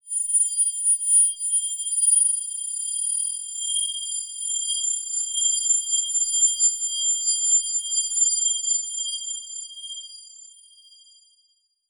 Title Transition Crystal Eerie; Production Element Title Transition

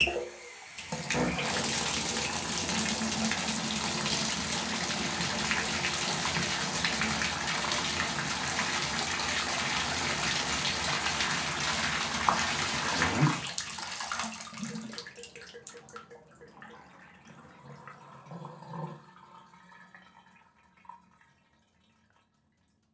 Tub Bathtub Turn On Shut Off, Household

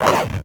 Zipper On Golf Bag Zipping; Sports

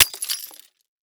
Impact Picture Frame Smash; Impacts

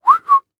Human Male Whistle Quick Short

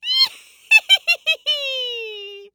Horror Scary Human Female Laugh Witch Laugh

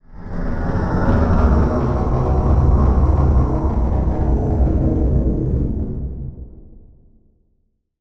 Transition Whoosh Drone; Production Element

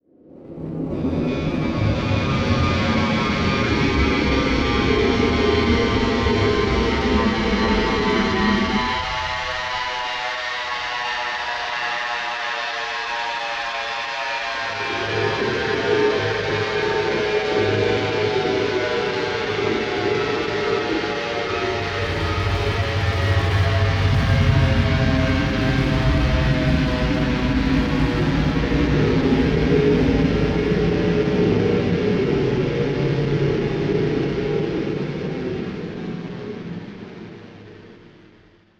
Pulsing Down; Adobe; Production Element; Imaging

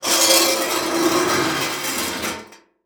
Impact Metal Scrape; Impacts

